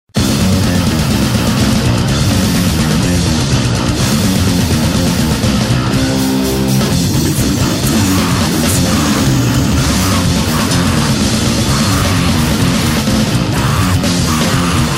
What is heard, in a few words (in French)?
Grind HxC sur Violent Blastbeat